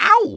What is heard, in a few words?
mario ow; smsunshine